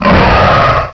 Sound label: Large Beast, Death Wails; Riviera